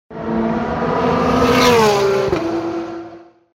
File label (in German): Klingelton GT3R